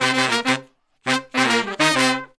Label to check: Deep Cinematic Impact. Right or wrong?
Wrong